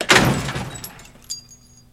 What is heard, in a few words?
Jeep Crash Metal Gla, car crashes